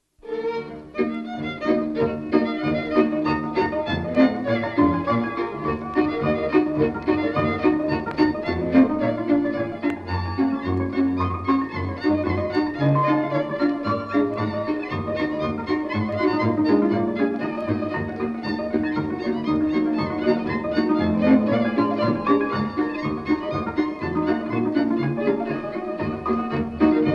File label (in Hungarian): Huszar verbunk; hungary